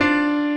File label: piano